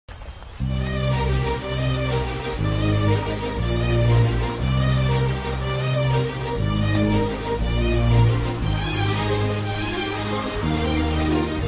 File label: telugu movie bgm; happy; gennellia